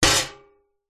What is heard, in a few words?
medieval sword impact metal shield vibrate